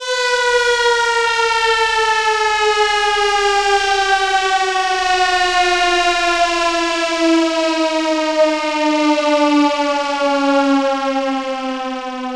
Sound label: ALARM